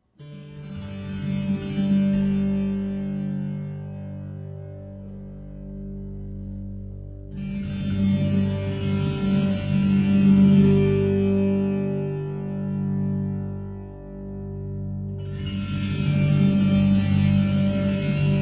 Bass-Körpertambura, Stimmung d-g-g-G (GG), dynamische Spielweise; Körpertambura® (Body Tambura)

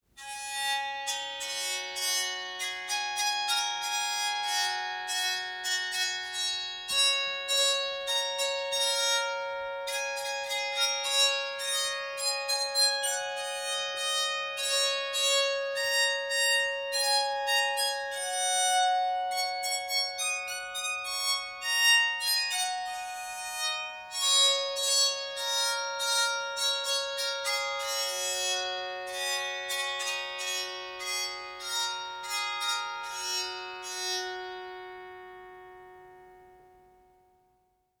Streichpsalter mit Holzsaetteln pentatonisch

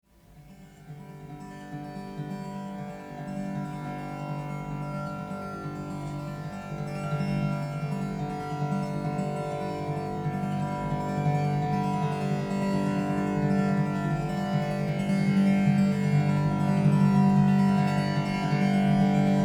Basskoerpertambura Stimmung d g g G GG

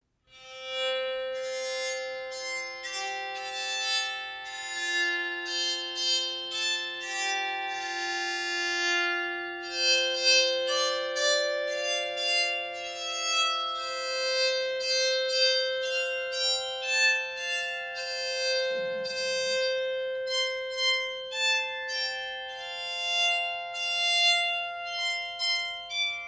Streichpsalter mit Holzsaetteln diatonisch

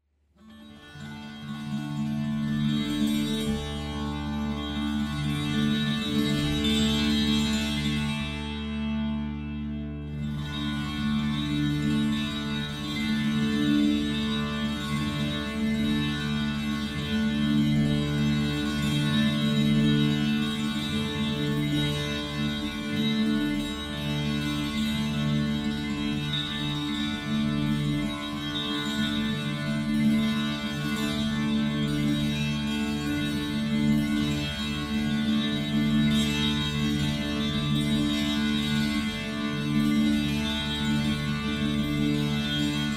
Körpertambura flach, Stimmung a/d’/d’/d (D), stetige Spielweise; Körpertambura mit flacher Decke; ca. 70 x 33 x 14 cm; ca. 2750 g; Körpertambura® (Body Tambura)

